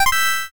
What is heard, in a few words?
progress done